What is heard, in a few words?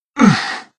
zero med pain